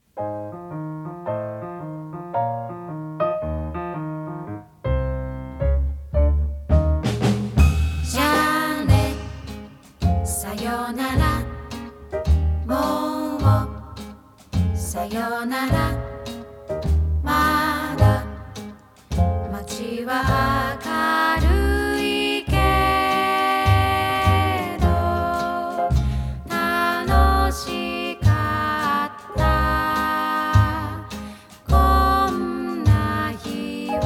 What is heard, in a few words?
Gentle Forest Jazz